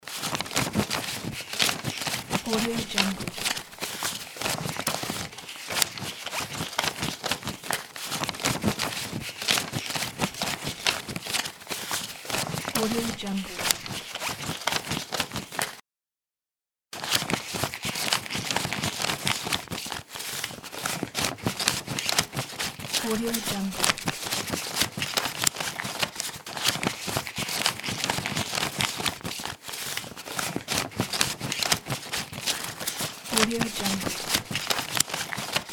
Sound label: counting money